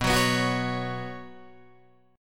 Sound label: B Suspended 2nd, Guitar, DADGAD